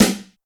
subtle reverb snare g key, Royality free snare sound tuned to the G note.